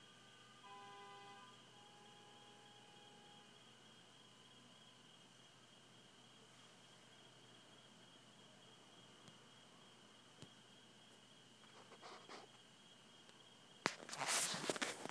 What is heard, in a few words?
people tooting horns